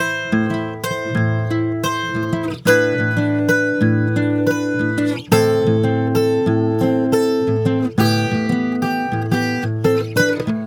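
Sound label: Hip Hop Guitar A major; Digidesign; Pro Tools; Pro Tools Essential Loops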